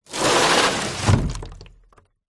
industrial large heavy 10l tank plastic full water slide hard ground; zapsplat